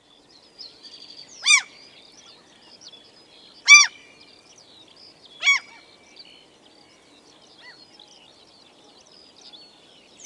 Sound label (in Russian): sizaya chayka larus canus